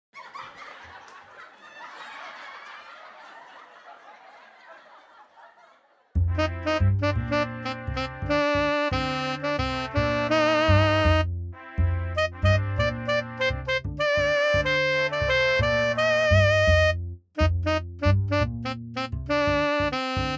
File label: MIDI blues; spellman studio